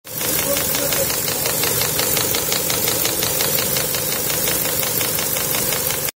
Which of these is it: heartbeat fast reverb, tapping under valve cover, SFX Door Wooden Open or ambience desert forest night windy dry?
tapping under valve cover